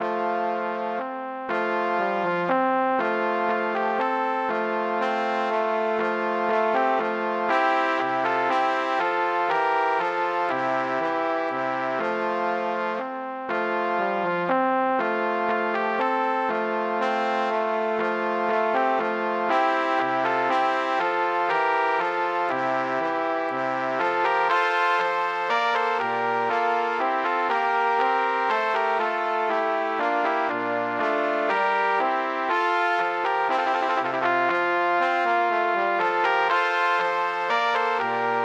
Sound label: menuet, 2 trumpets trombone